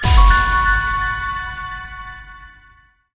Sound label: medium Win; parthenon